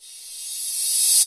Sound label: Cymbals Reverse Crash; Vengeance Samples